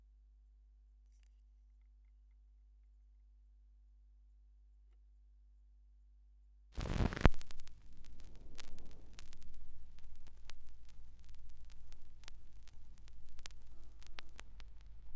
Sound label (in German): schallplattengeraeusche